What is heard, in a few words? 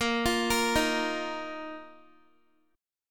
A sharp Suspended 4th A sharp down Guitar; 4E,4A,5D,5G,5C,6F; arpeggiated